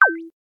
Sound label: Button Over; Rally Champ